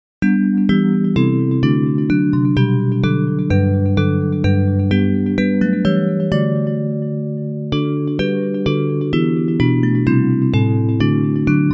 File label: Bells